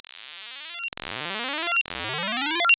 Impulse Train, wavetables; Architecture 32

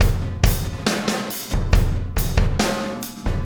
Beat, Loops

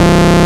SYNC WAH, Jupiter Synths; E-MU Formula 4000 Series Vol. 3 – Analog Odyssey

